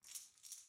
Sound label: coins; golden tavern